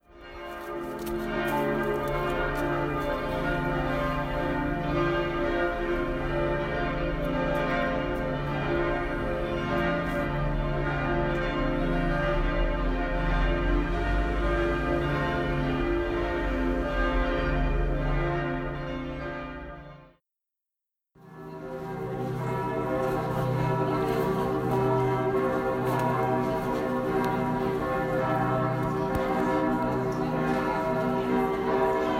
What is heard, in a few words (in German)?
Weihnachtsgeläut 3 Kirchen